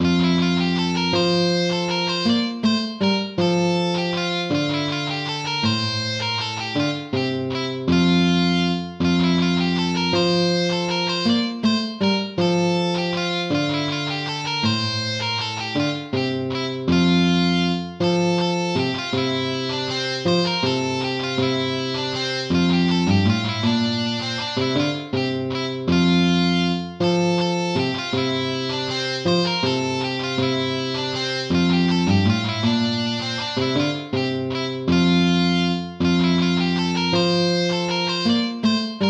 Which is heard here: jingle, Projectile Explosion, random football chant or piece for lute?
piece for lute